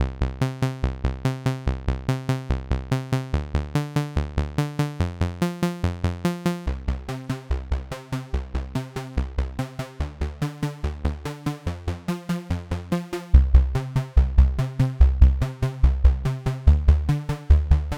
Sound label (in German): basslauf ensemble eq; synthmaster